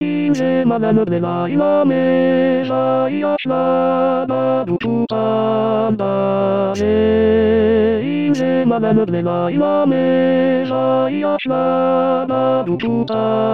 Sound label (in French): Tenor